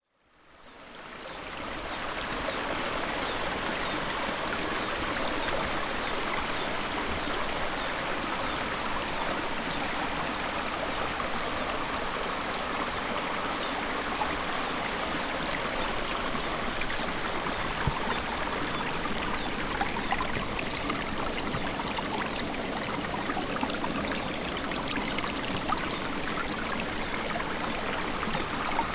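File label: gravel path, bird calls, murmur of the river; Chino Cultural Complex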